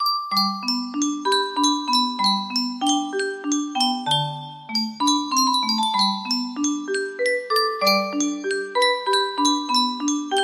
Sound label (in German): yunsheng spieluhr